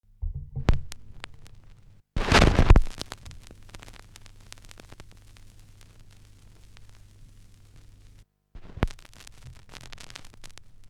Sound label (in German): schallplattengeraeusche